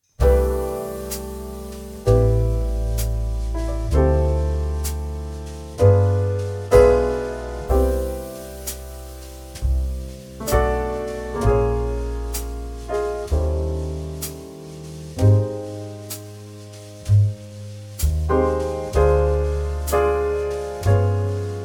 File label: Trio